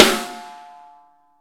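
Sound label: snare ambient; Best Service; Real Mega Drums VOL-1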